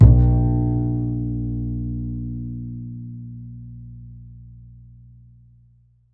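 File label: double bass A#1, Jazzistic; Univers Sons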